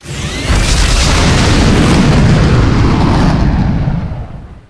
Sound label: Warp